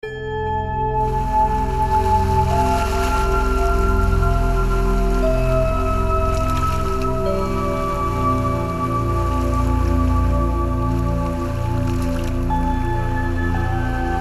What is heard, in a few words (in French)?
Ocean zen, 432 Hertz, Calme cristallin